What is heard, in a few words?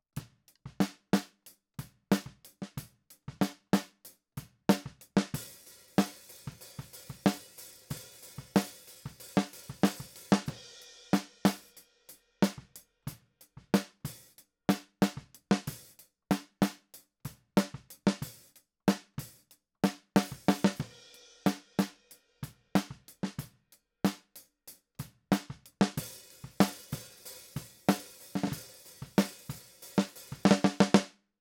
Snare